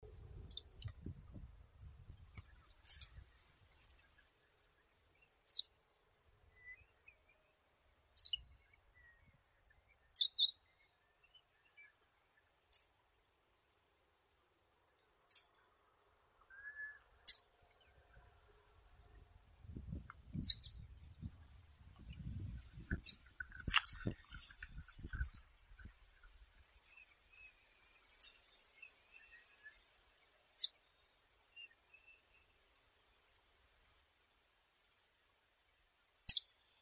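Pallas's leaf warbler Phylloscopus proregulus, nature sounds; penochka korolkovaya; zvuki prirodi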